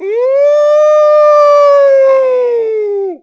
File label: Siren; Zelda